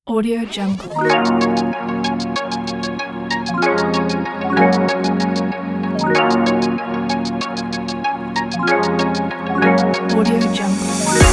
lounge logo; sergeimaloy

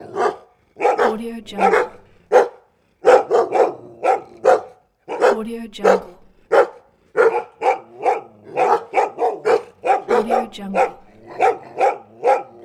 two big dogs barking

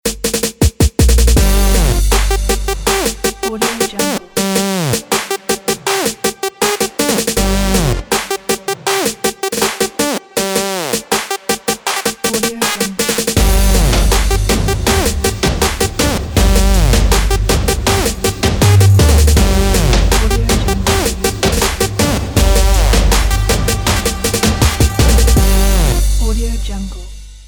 urban logo pack

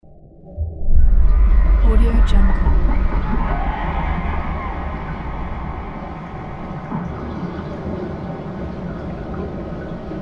mystical underwater background; soundwood

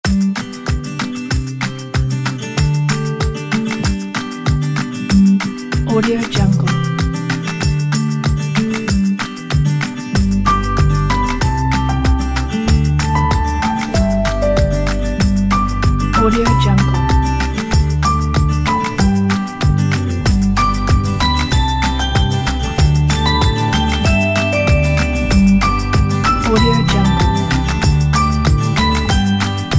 upbeat and fun

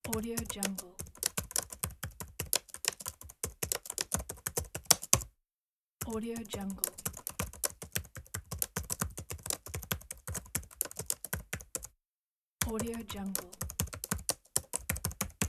laptop typing

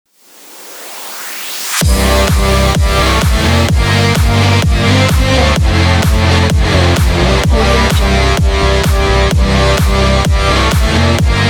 energetic rock

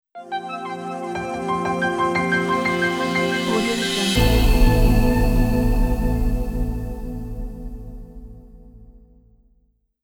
short hitech logo

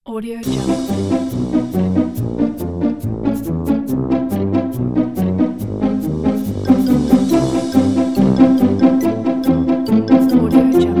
funny

